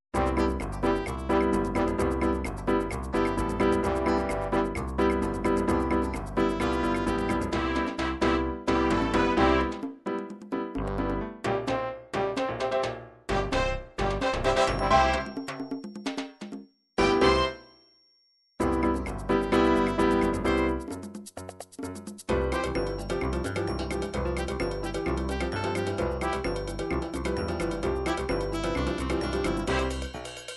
psr9000 merengue, midi

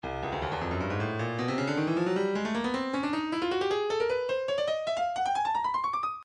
breakbeat midi files 140 170 bpm 155; Stylus; spasm

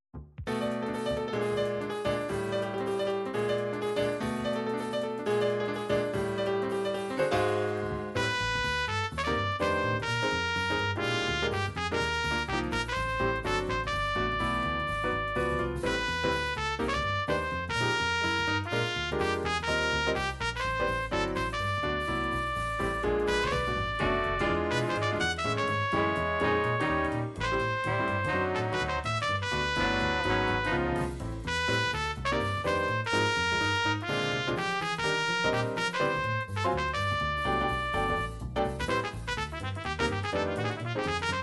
jazz

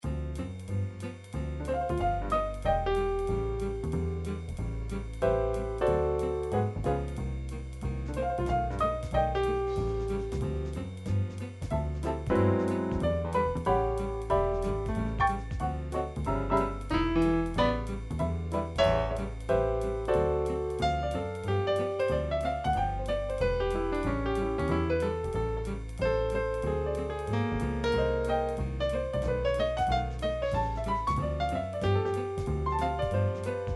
jazz piano

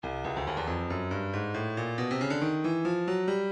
breakbeat 138 bpm; Stylus

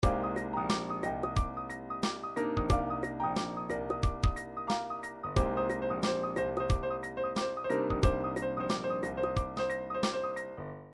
triphop, midi; Newtronic Triphop Construction Kit

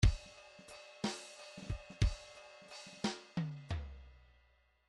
midi file fills 3 4 fill

